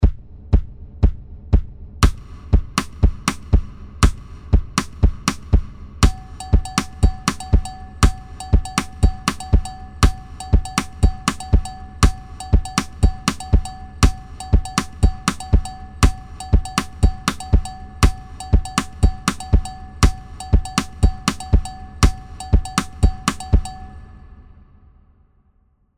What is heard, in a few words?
More Cow Bell